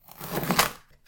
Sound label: cutting green pepper; cooking